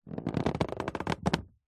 foley luggage leather hard case press down pressure creak